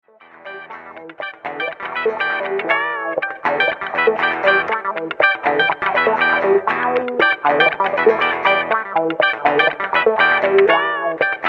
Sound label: funky guitar rythms and licks